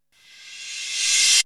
Reverse Crash, Drums; ZERO G - Datafile DISC 1

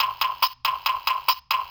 Disco Drum Loop, drum machine; Funky Fever Disco Drum Sample Pack; 300 Drum Machines

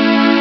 jump; ST-XX Amiga Soundtracker Sample Packs

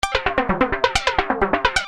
Arpeggio 130 G, Techno Synth Loops; 130bpm; Sonic Foundry (Sony Creative Software); Signals I

